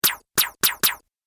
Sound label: Arcade Game Laser Pistol 4 Shots; Video Game Sound Effects; Retro Inspired Sound Effects - Think Nintendo, Atari, C64, Etc.